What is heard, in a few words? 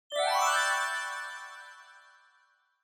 Scene Change High Up Fast